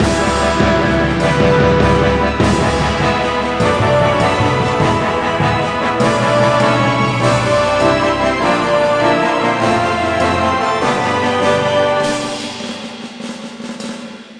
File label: Menus Mission Complete; Aces of the Galaxy; XBLA Windows Xbox 360